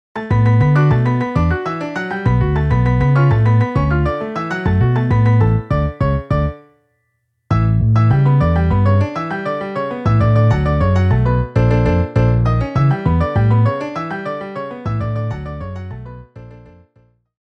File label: Piano Bass MIDI